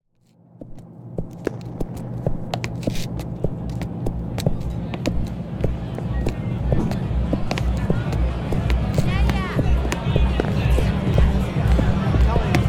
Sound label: Human Nature; Field and Foley